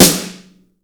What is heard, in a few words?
POWR SD, POWERDR; AKAI S6000 CD-ROM - Volume 1; DRUM RYTHM